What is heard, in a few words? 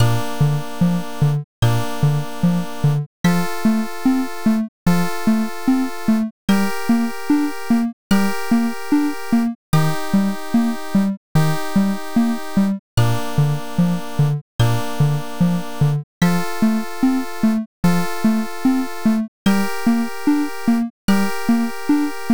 bgm